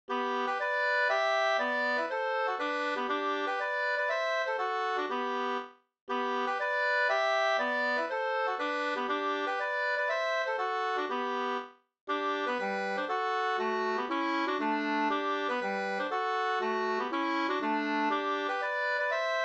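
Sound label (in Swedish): polska, tvåstämmig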